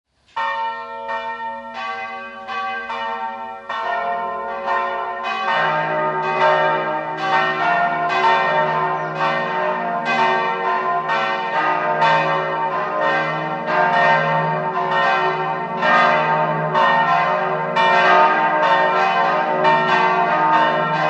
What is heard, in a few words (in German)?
glocken; Eichlberg